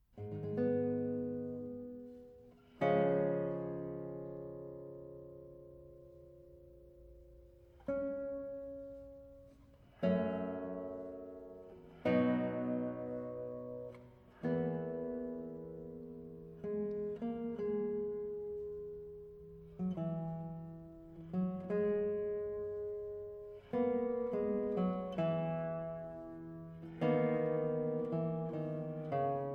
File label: guitar